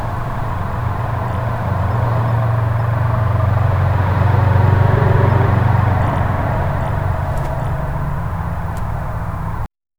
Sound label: a nice breeze passing on